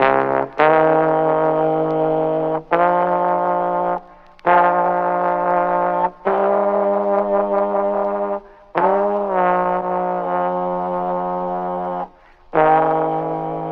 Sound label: horns farty blows, Loops